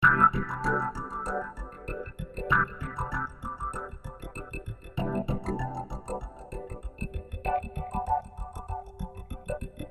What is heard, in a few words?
Swinglefunk C